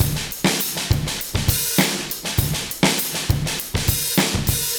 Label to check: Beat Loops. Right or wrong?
Right